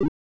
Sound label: bump, 8 bit sound effects